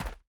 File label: Gravel Walk